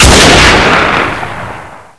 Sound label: fg42 shoot, weapons